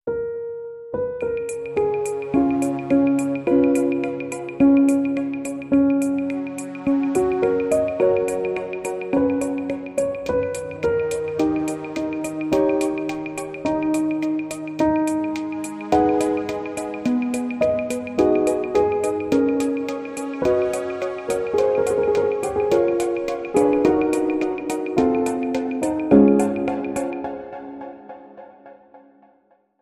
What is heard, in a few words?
Chillstep Remix; Chopin; Nocturne Op 15 No 2